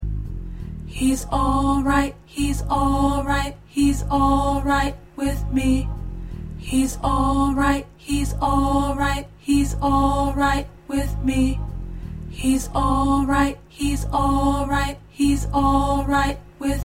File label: Tenor, choir parts